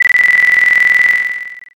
trainbell

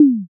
tom

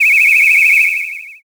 whistle long

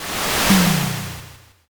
fire magic

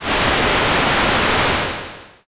fire

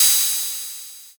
cymbal

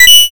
correct

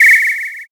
whistle short

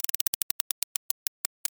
roulette

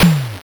pistol

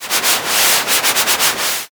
river

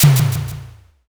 pistol

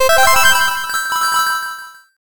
jackpot

